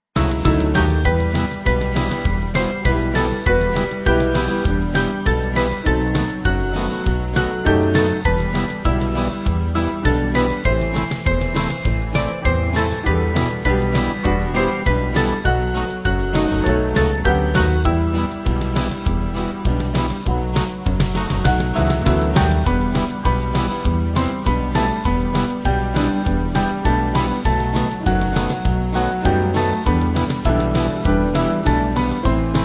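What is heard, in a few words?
Band, Music Backing Files for Churches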